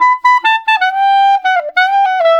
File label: Clarinet